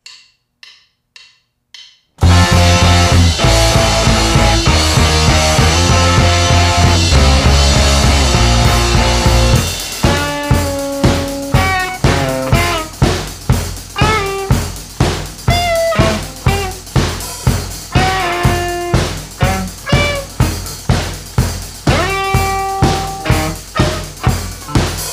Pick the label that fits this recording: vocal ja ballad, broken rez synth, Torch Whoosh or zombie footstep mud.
broken rez synth